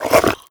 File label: breath in, headcrab black; creatures